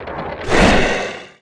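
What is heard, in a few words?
Attack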